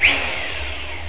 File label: drill off, machines